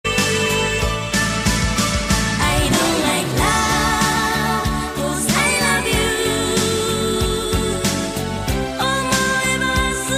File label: bwahahahha